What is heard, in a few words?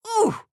AHurt